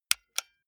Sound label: console controller xbox rt press